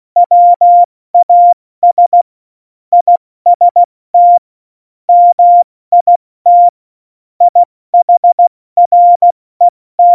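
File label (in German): Witz, cw morsen; Morsekurs; Witze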